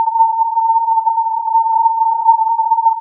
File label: filtered input